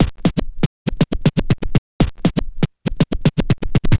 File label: Scratch